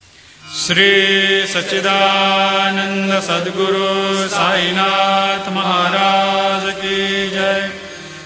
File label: ringtone; shirdi sai baba